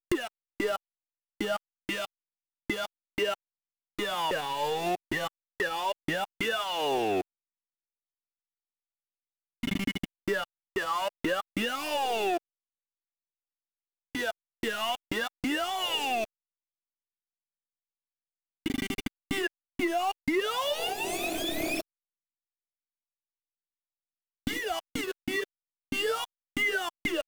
melody

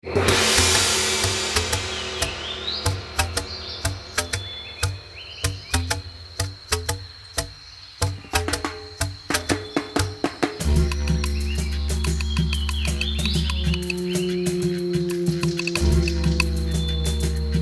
Tree Village Peace; Serious Sam 2; Magnor

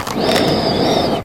Accordion Empty Pull; angry birds

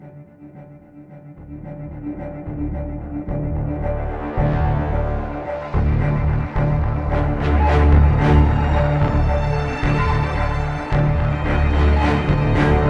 Suspense Oneshot

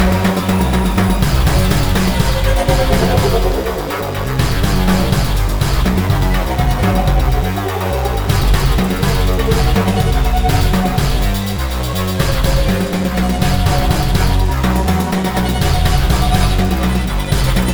pulse

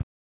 Ball Lands on Grass

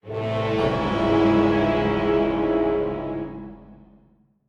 WAVE FINAL BEAT; Dungeon Defenders Eternity

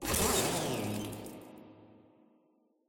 paint dispenser broken; Poppy Playtime

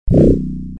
Fire Shield; Tails Nightmare 3 Demo

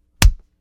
Body hit

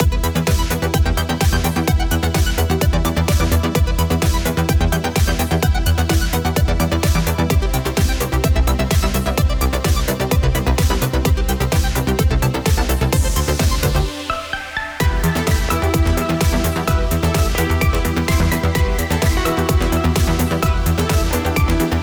Electro Menu; q 3ds gamerip 2015

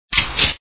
Reload

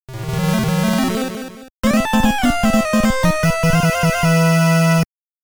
Appear; konami famicom chronicle vol.1 disk system edition

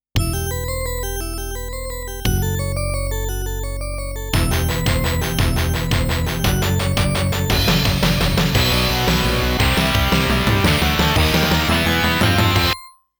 COUNT DOWN (Continue); King of Fighters 97

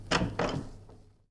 Control Door; The 39 Steps; 2013 gamerip pc macos linux

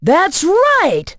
Correct

